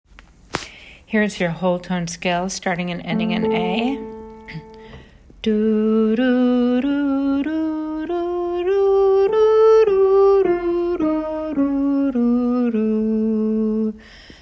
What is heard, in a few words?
Whole Tone Scale